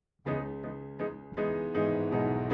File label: Piano A